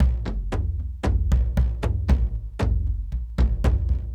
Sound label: Kendong, World Percussion; Brekete